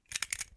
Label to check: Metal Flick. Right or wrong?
Right